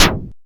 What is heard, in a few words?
Mog Snare, dry snares; Quasimidi Rave-o-lution 309